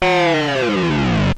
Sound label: Korg Monotron Panning Stereo